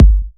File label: Bassdrum; Vengeance Samples